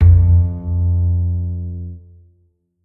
Bass Note; Bendy Lone Wolf

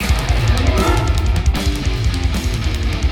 Boss Start Track; Red Ball Super Run; Android, iOS

